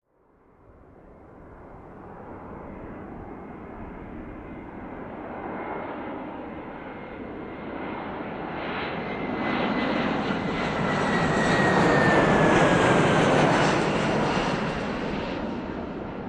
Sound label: Aircraft taking off whirring